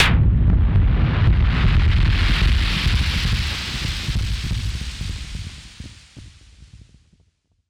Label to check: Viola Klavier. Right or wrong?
Wrong